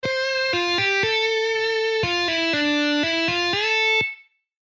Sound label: Guitar scale exercises